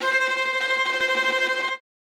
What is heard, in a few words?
tremolo